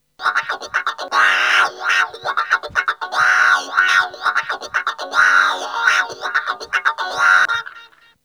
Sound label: Funk